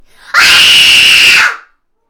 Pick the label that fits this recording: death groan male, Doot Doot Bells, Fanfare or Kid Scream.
Kid Scream